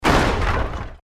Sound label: EXPLOSION MEDIUM WOOD; Created explosion effects, house, building or vehicle effects; Bombs; Bomb Sound Effects